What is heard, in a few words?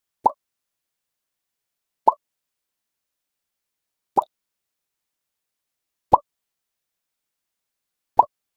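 sound effect a fantasy rpg, potion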